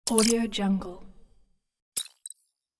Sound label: complex scifi ui button click